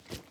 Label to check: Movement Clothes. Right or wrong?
Right